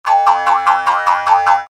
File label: jews harp